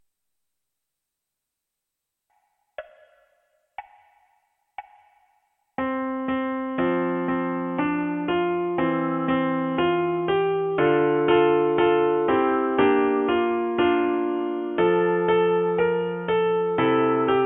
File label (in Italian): piano unite